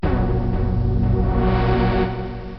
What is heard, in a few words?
complete, wave